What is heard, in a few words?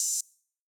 Open Hat